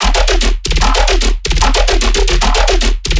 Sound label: bass loop weird sneeze 150 D#; Virtual Riot